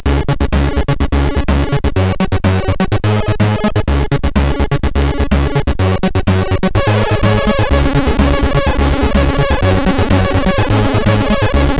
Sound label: Funny CSG8580R5; hvsc